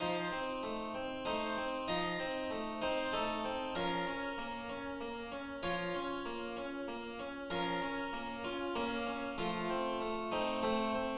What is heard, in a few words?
Silent Night minor